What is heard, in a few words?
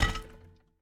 idle staff lift; smite; ahpuch skin10